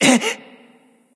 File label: surprised; smite; ahmuzencab